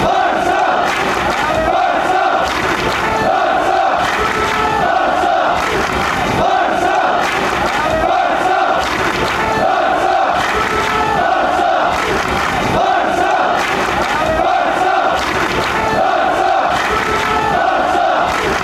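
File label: barca clap, football songs and soccer chants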